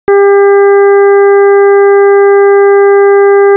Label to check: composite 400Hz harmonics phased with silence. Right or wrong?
Right